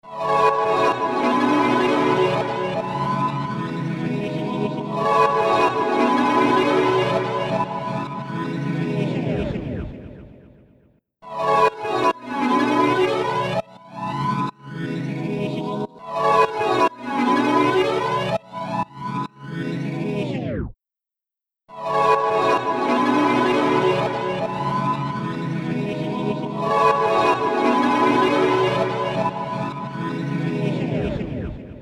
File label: Harmonizer Synth Pad Dual Complex Delays; H949 Harmonizer